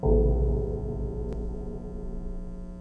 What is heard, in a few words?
bell